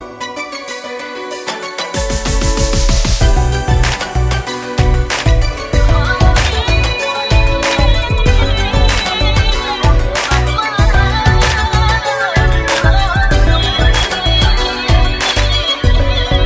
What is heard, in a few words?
Mashup Ringtone; Raaz